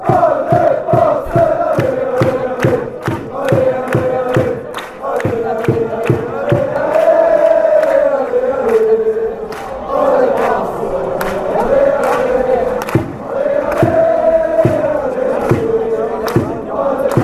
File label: allez, fan chants